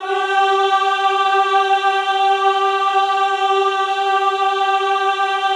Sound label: EHH G3, Classical Choir; Best Service; Extended Classical Choir; EHH MIX